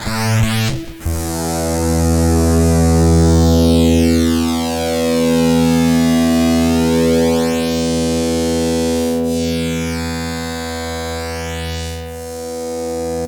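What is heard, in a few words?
fuzz guitar, Hoax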